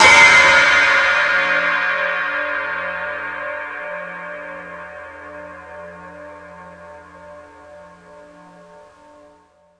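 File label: cymbal hi, drums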